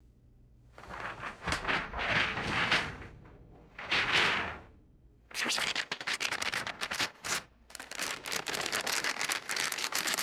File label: large stiff paper crumpling and moving